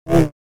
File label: Saber; FXHome